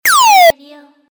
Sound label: Blaster; form the 80-ies